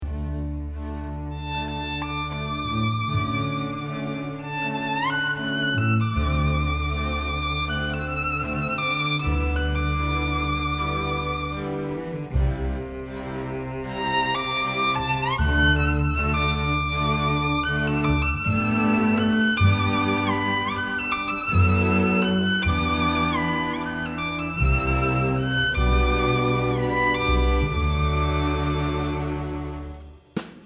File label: Harmonium